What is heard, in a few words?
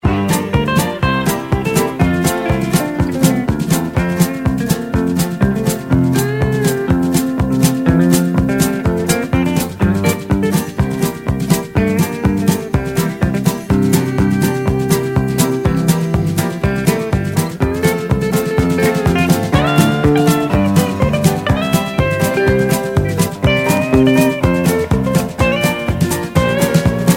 music